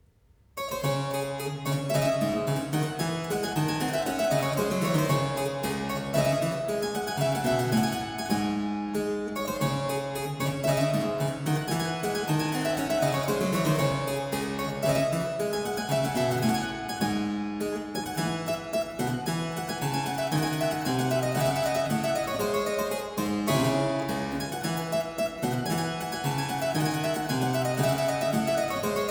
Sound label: Rigaudon, harpsichord; Complete Chamber Music; Brilliant Classics; Miscellaneous pieces for the harpsichord